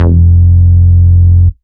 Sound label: Root F, Bass